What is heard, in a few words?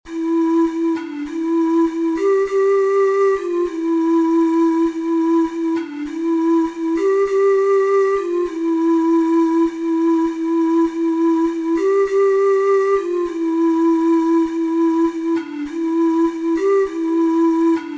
angels, ocarina four hole